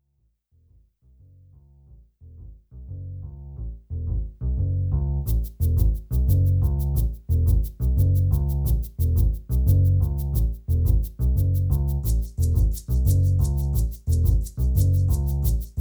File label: (Instrumental)